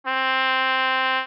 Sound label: trumpet